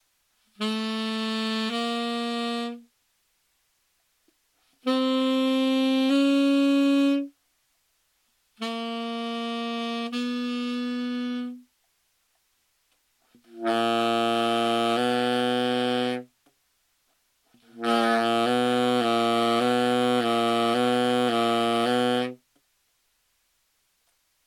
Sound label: minor second saxophone